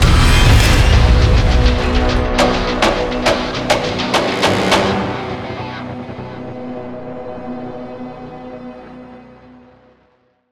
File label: Short Signal